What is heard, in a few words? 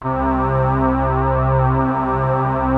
MELLO PAD, poly 800; TECHNO-BWARE